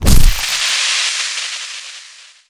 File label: critical melee player